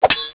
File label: sword; Ronin